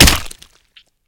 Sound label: Flesh Arm, Gibs; zps